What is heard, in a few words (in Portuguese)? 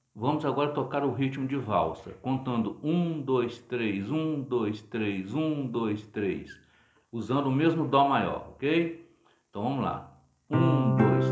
Ritmo de valsa